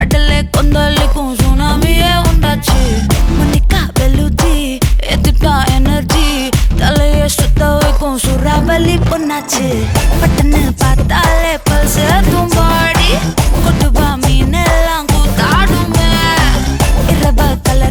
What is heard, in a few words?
Tamil